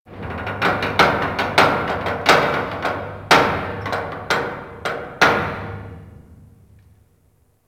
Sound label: Derelict Metal Creak Slow, Interior; Ambience; Warframe; Orokin Derelict